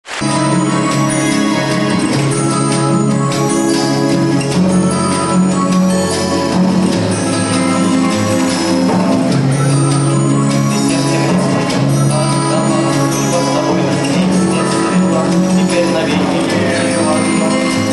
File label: duet; NotreDamdePari